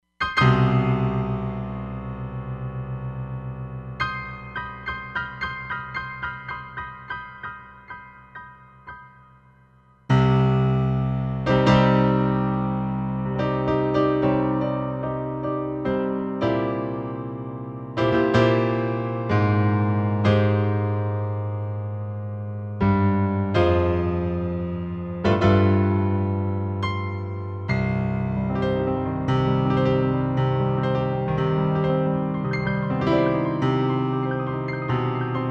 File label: Concert Grand, Roland Fantom XR